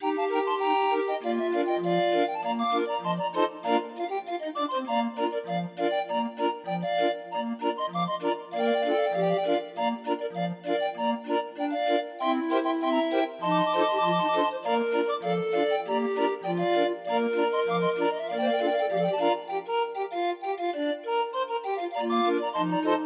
Grind Organ

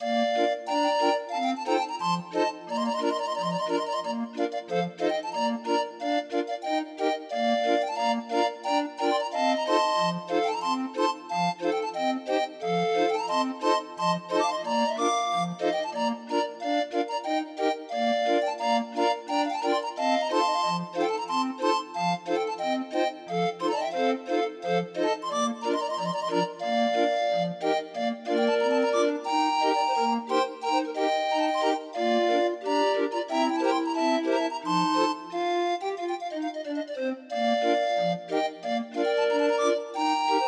Grind Organ

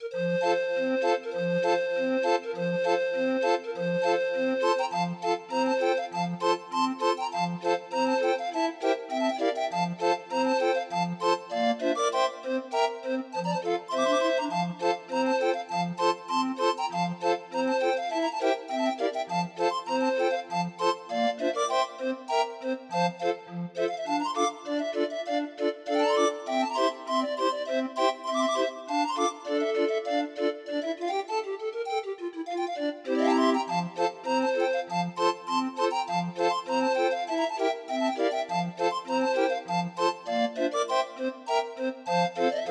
Polka, Grind Organ

